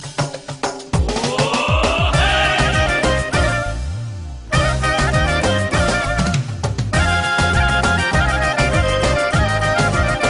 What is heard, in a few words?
albanian instrumental music